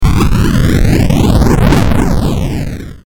attack, explosion; sfxr

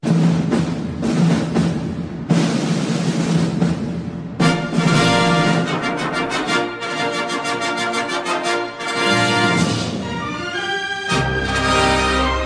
Drums Instrumental

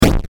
hit; sfxr

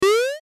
jump; sfxr

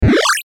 powerup; sfxr

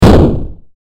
bomb, sfxr

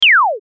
laser; sfxr

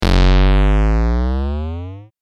limp; sfxr

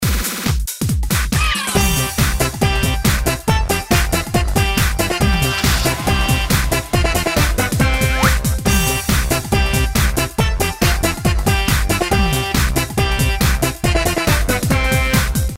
bgm; nexon; kart

